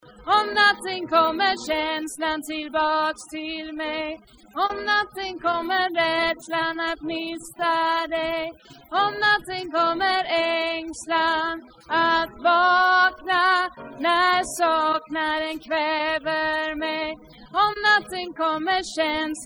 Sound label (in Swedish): bas, stammor